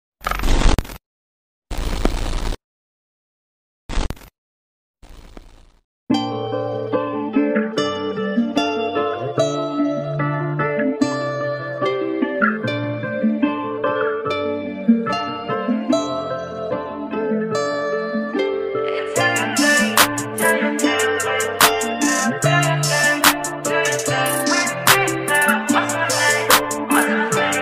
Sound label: instrument ringtone